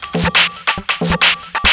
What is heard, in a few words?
drum 138, drum-loops, loop-grinder, Techno